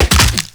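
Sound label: speed bump; WhiteOut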